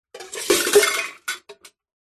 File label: impacts metal cooking pots hit drop crash